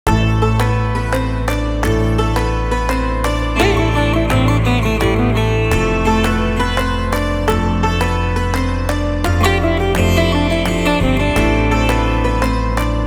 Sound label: Ringtone